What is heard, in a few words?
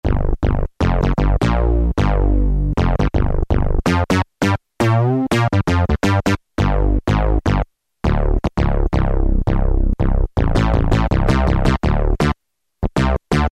SYNTH BASS, Casio VZ-10M; VZ ROM Card